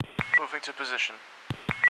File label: Moving To Position